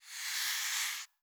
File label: Soft Slide Long